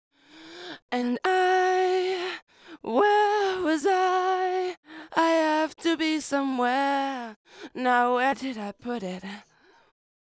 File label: Lead Vocal Verse II; Multitrack